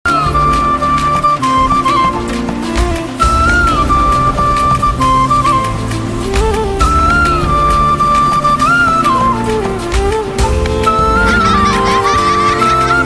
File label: flute ringtone; title song; new telugu song